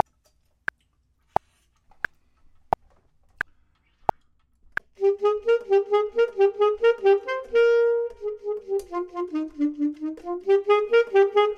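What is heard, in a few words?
top, Saxophone and Clarinet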